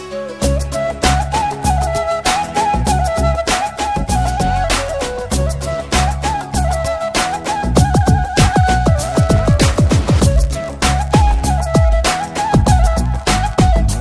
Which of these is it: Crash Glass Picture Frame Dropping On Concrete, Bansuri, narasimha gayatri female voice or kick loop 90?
Bansuri